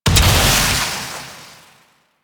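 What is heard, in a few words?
Invisibility Projectile Explosion; Warframe; Quests; The New War; Drifter; Abilities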